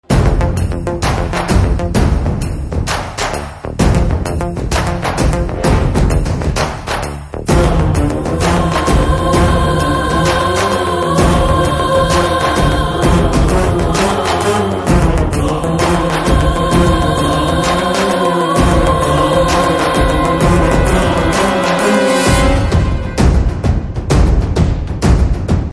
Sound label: BGM Ringtone, Telugu; Savyasachi